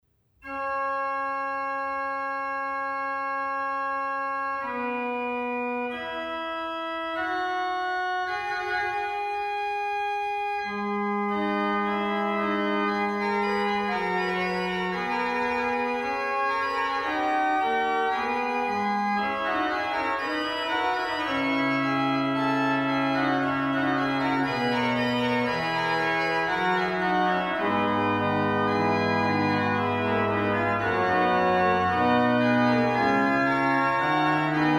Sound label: Cornet Ad Te Clamamus; Arnaud Van De Cauter; Salve Regina